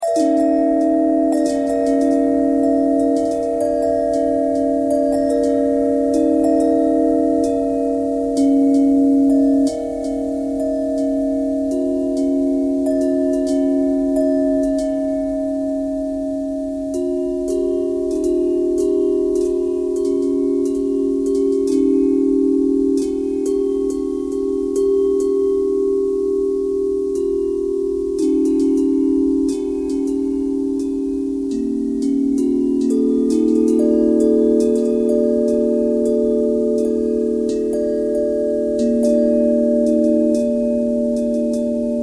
bell bell thread noise, bells

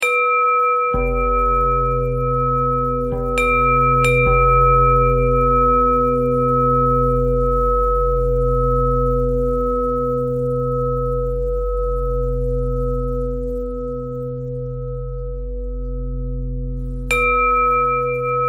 bell thread pentatonic

